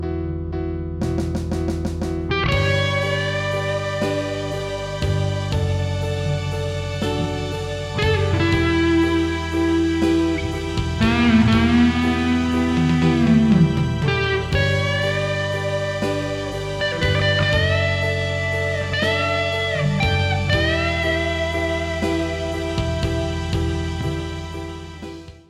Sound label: clean